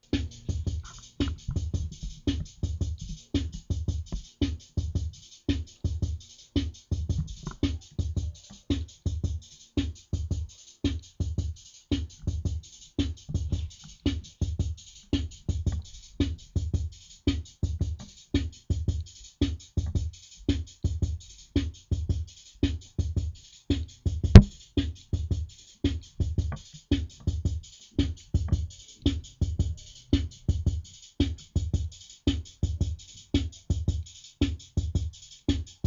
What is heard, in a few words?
slowrock